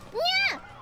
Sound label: NYAA